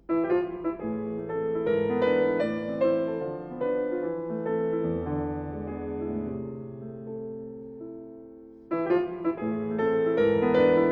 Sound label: Arabeske, Minore, Etwas langsamer; Schumann